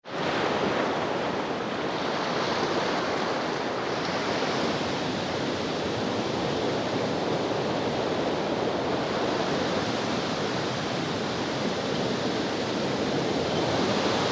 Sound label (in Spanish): mar olas